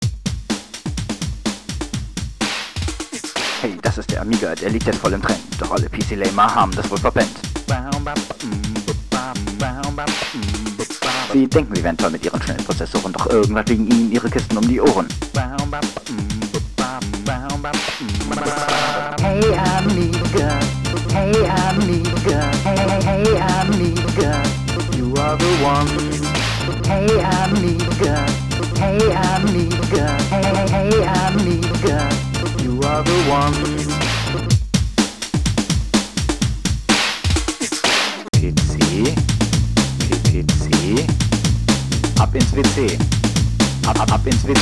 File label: rap